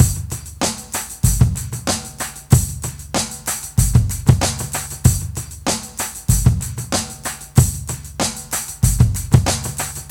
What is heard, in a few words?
Rhodes Rhythm 95 bpm, breaks; Zero-G Beneath the Planet of the Breaks